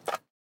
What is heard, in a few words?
turret turn mg light end